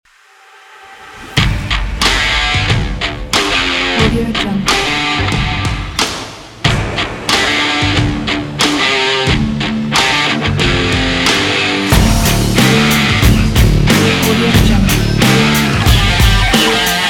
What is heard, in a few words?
upbeat sport rock